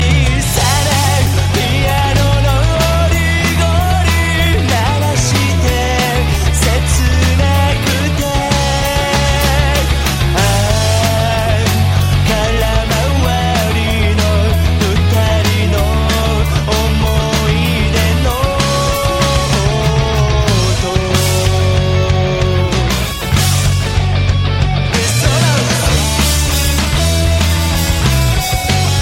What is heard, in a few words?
Death Piano